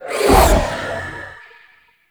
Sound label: Wraith Teleport; Enemies; Warframe; Zariman